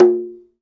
GENDANG A5, DRUM; Spectrasonics; Heart of Asia

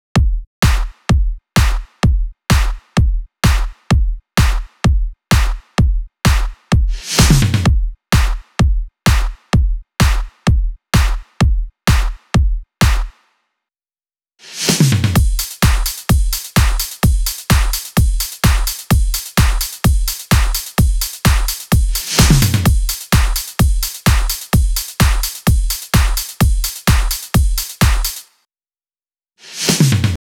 128 BPM Full Drums; Vengeance Essential Clubsounds Vol.5; VEC5 Demo Constr Kits; Slide Kit; Root G; Drums & Perc

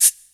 PC, Casio CTK-5000

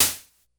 Snare, Drum Machines, Korg DS-10

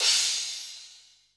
XR10 CRASH, drum; Crypton - DrumBase5000

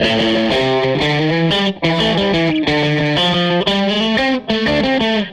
Les Guitar Loop

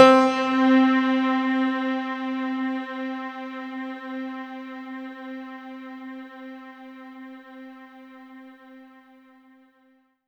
C4, Synth Line; 90 s Sample CDs; NiceBeats; SynthLine VOL-23

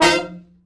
Killer Horns, SECTION 7TH; Best Service

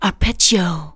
vox, Techno Trance; Ueberschall

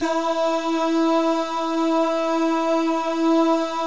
DAH, Vocal Jazz; ILIO

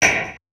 Snares, Alesis Performance Pad

